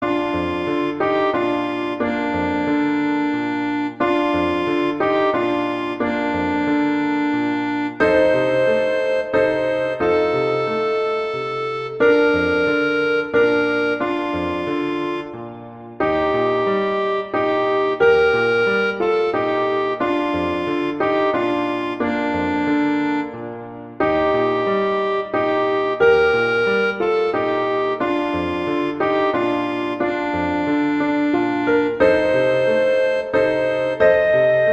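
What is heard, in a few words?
Silent Night, string quartet; Gruber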